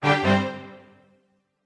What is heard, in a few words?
Battle Strike